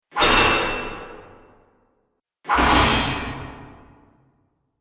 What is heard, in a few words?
Sword Whooshes Medium Hall, Sword Sound Effects